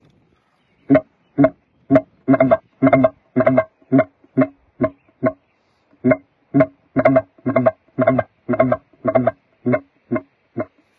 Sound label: glunking sequence, elk sounds; elk sounds explained